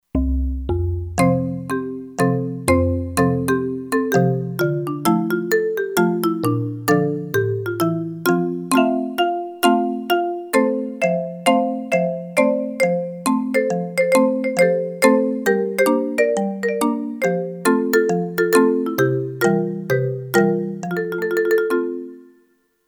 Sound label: Excited Marimba, Kurzweil K2500X; Factory Programs